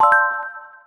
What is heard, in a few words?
dialog ok